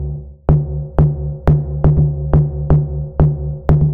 122 BPM Drum Big Drums; mmb studio melodic

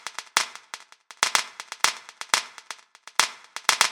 122 BPM Metal Plastic, drum; mmb drum arsenal vol 1

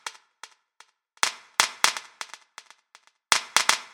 122 BPM Metal Plastic; mmb drum arsenal vol 1

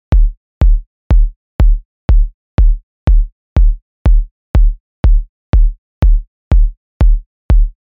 122 BPM Kicks Step 2; mmb drum arsenal vol 1

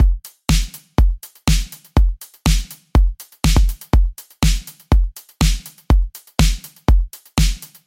122 BPM Battery Full Drum Essentials Pro; full drum kit

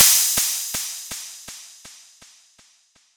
122 BPM Crashs; mmb drum arsenal vol 1

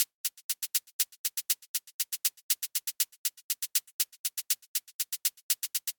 122 BPM Shakes; mmb studio melodic

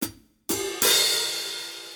122 BPM Hats Hi Hat; Spectrum; percussion essentials 1

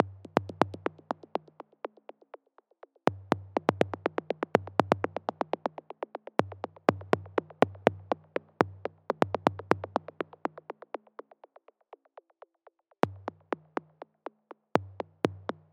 122 BPM Clicks Rythms; mmb drum arsenal vol 1

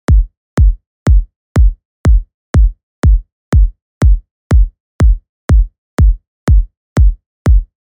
122 BPM Kicks; mmb studio melodic

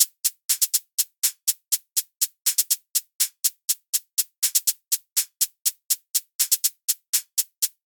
Hat Hi Hat, 122 BPM; Essentials; percussion essentials 1

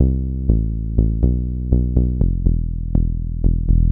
Bass Synth Cmaj Amin Tonic E, 122 BPM; Pro Bass Synth Studio Kit; MMB

